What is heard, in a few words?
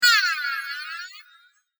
Chimes